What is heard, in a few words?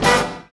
HIT Band, Big Band Hits; Club-50 - Foundations Roland; Hit Combo